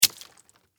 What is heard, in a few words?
Impact Wet Hit Small Object Drop; Miscellaneous Impact